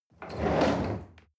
chair wheel roll on floor hard squeak

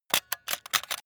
metal small spring handling fast

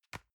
envelope drop soft

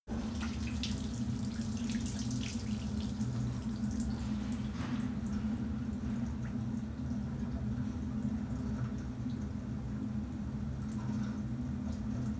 cleaning machine dripping water inside machine

